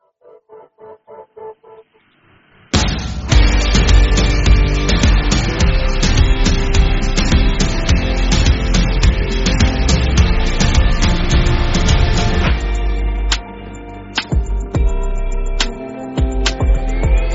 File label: Instrumental